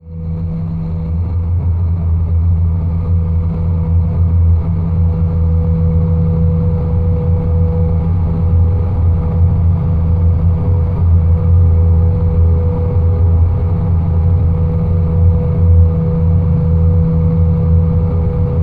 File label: pattern doom